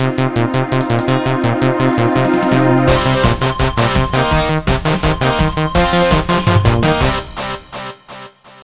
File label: Victory; Ferrari GT Evolution; mobile